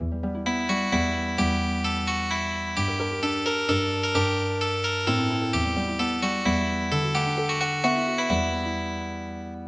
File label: Healing Harp